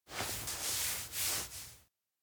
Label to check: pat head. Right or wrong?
Right